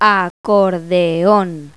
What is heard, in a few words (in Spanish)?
ACORDEON; Pipo-Aprende musica con Pipo